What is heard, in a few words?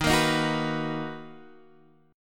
B Major 7th Eb